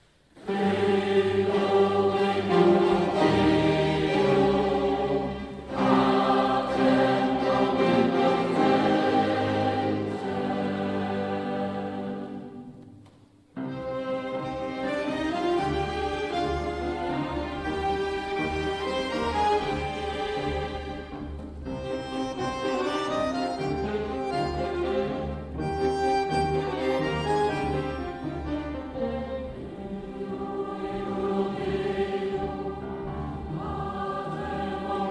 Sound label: Tango Messe Credo